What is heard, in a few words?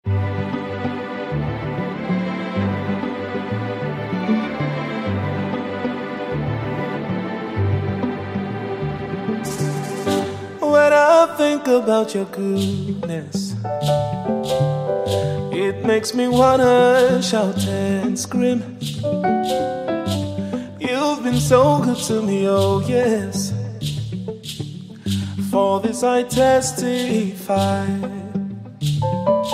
Acoustic version